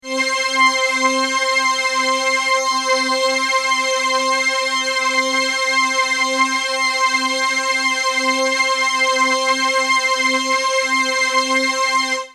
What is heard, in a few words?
RS09 Next chorus